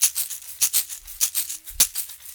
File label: Berimbau Shaker; World Percussion